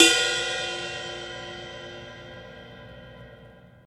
ride sample a sharp key, Loudest frequency: 3596Hz; cymbal drum samples